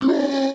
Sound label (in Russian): udar